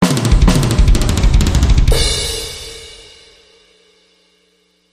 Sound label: Rock Solo